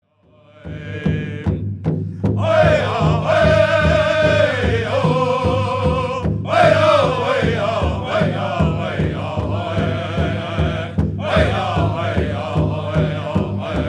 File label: Intertribal